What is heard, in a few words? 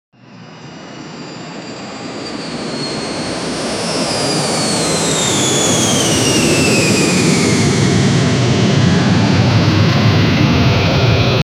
jet plane breaking the sound barrier